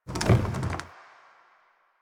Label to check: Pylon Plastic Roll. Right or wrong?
Right